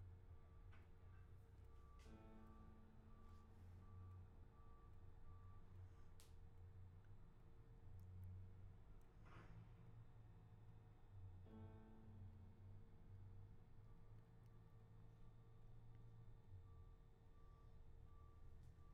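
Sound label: vocals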